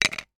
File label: rice cake put down on plate